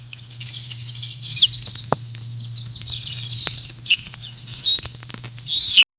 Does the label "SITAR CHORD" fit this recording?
No